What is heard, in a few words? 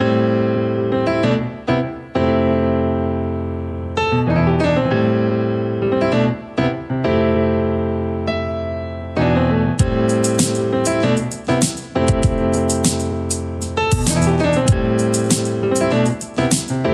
night lounge 432 HZ